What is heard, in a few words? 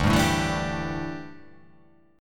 D Suspended 2nd Suspended 4th D down, chord; strummed